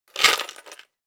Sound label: Putting a jewel in the jewelry box